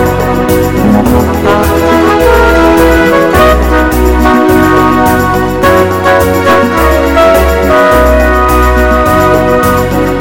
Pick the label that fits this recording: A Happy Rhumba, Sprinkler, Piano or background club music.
A Happy Rhumba